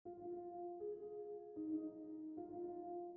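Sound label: simple gentle piano loop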